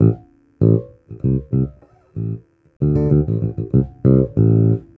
The Funkateer, Rock Bass Loops; ACID MUSIC

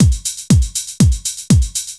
bdrum

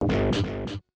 BASS SHOT HOUSE 125 A; eJay; Singleshots

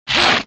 ROCK

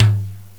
Jazz Tom; GMPlay; Drums; TG300B

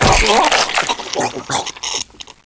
gib; SINDEMO; player; blade

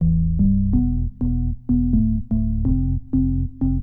ORGAN HOUSE 125 A; eJay; Keys

